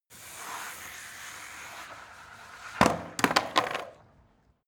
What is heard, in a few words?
skateboard indoors slide drop; sport freizeit